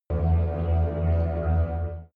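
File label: extrinsic chirp, energy; energie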